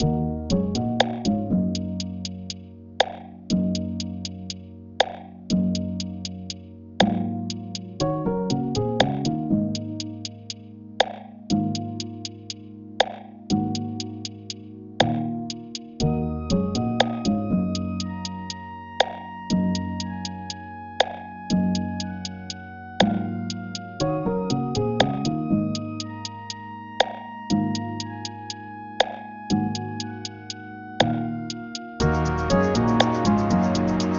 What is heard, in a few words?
Lo-Fi Phat & Lazy Intensity 1